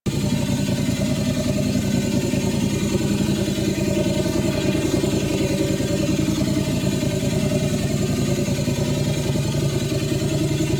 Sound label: Damage Suppressor Loop; Warframe; Missions; Zariman; Zariman Assassinate